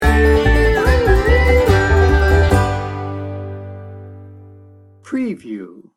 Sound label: oo-wee sting bumper